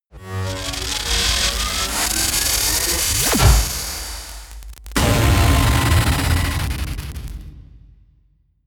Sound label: electricity